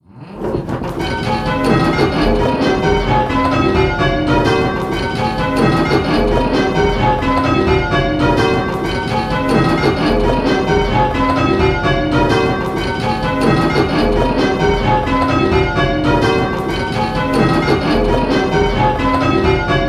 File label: Striking 8-Bell Call Changes, bells; Pebworth